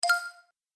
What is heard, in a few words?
Musical Game Elements, Video Game; Twistedtracks